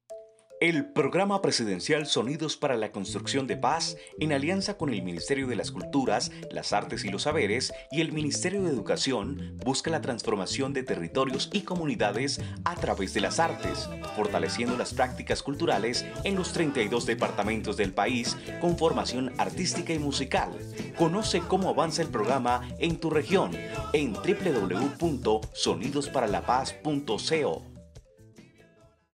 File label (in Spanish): jingle; SONIDOS PARA LA CONSTRUCCION DE PAZ